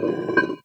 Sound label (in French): plaque d egout